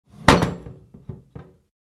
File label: Kitchen oven oven door close; cooking